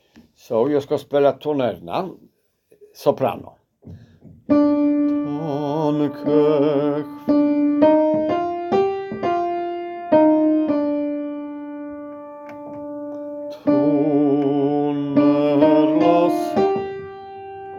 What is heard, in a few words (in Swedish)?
sopran